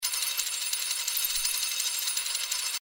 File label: bonus prize coin spin loop; Temple of Iris 2